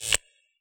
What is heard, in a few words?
Reverse Percussion; 155 DJX Kit